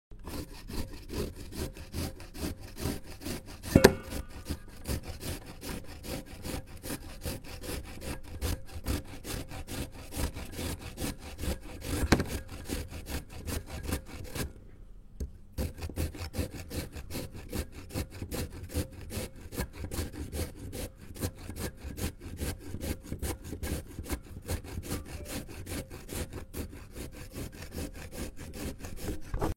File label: Grating Sound